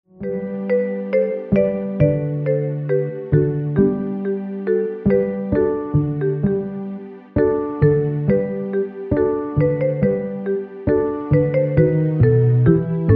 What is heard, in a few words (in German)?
Ode an die Freude Rhythmus schnell